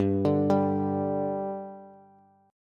G 5th G Bass, chord; G5 arpeggiated

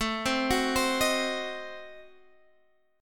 A Diminished 7th A down Guitar Open F; arpeggiated

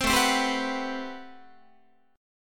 A Minor 13th A Guitar; Am13 strummed; JGuitar

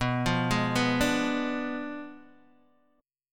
B Diminished 7th B down Guitar Open D

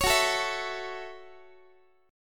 Gb Minor 7th Gb, Guitar; strummed; x,x,16,16,13,15; 4A,4E,5A,5D,5G#,6C#; JGuitar

